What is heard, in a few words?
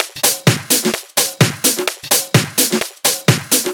House Loop, 128 BPM; Vengeance Samples